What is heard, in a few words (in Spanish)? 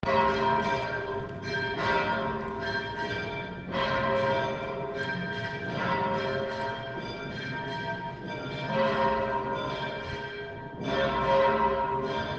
campanas iglesia de la encarnacion peal de becerro